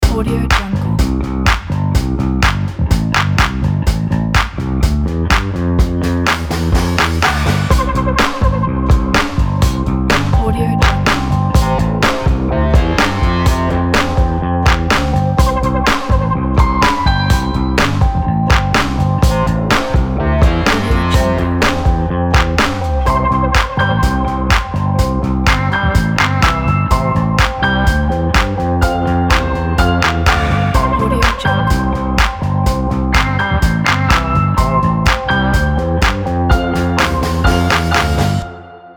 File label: energetic upbeat pop